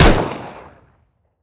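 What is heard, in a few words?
mike fire player, weapons; gmod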